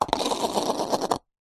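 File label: sport golf ball knock into hole cup spin to stop; zapsplat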